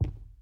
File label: Footstep